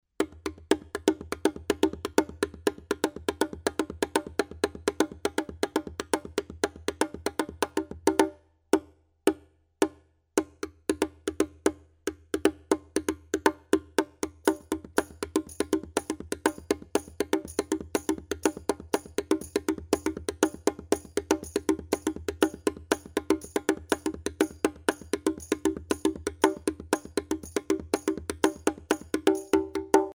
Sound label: body drum